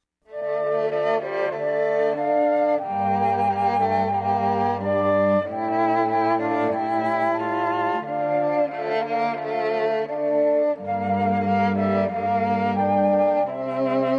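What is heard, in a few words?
(Flute Quartet); Cantilena South Florida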